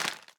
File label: Footstep Fertilizer